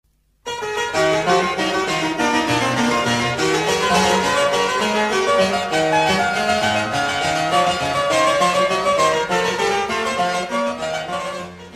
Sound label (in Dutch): klavecimbel; Piano of klavecimbel